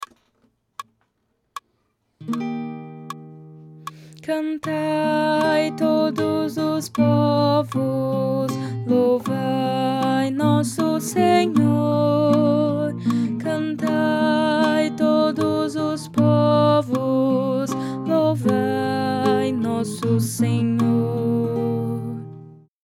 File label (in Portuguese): Contralto